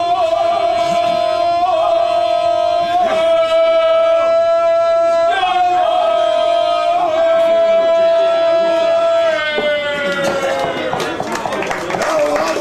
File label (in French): polyphonie ganga